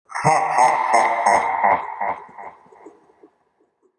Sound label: Scary Laugh